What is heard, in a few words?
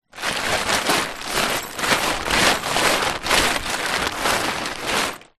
tent ground sheet movement; foley